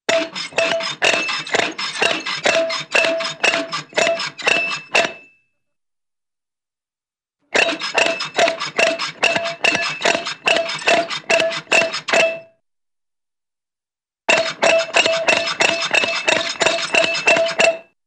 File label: Steady Fast Metallic Squeaks & Ratchet-like Clunks, cartoon; Hollywood Edge; Cartoon Trax